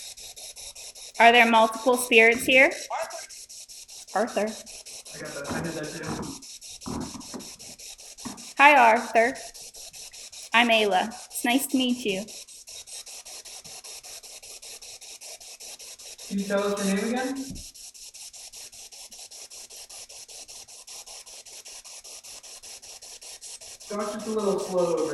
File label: Ballroom Spirit Box